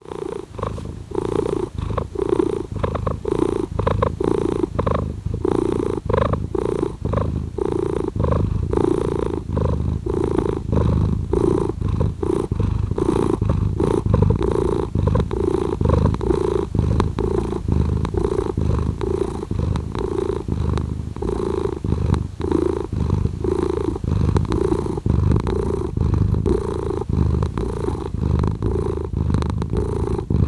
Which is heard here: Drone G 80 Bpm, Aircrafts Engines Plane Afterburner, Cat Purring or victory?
Cat Purring